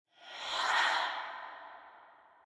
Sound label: mirror Breath; fairymirror